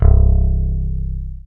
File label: Low piano Bass